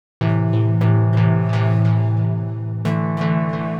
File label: DEEPHOUSE ORGAN 125 A